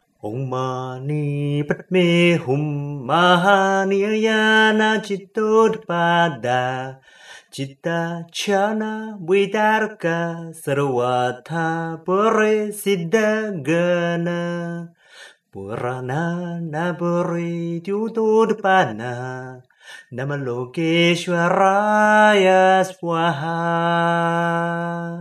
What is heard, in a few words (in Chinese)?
梵音觀音靈感真言唱頌; 觀世音菩薩系列 Avalokiteśvara Series